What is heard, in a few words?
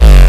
Kick Tail, Raw-Hardstyle; raw hardstyle kick pack 1